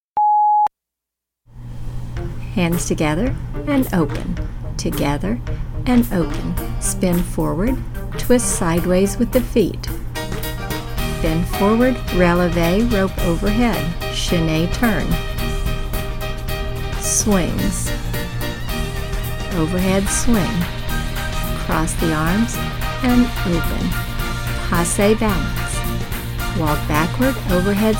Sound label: with VO; Level 1 Rope